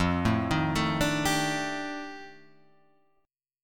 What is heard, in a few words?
F 13th F, chord; arpeggiated; {1 0 1 2 3 3}